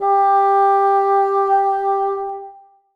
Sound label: bassoon g4; Sonatina Symphonic Orchestra